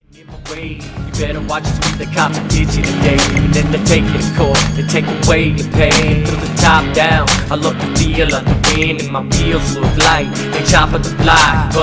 ON THE ROAD WITH GUITARS

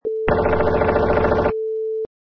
Machine Gun

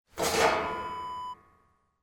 water tank lid open

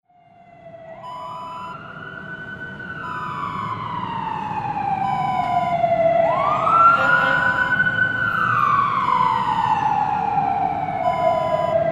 ambulance and firetruck

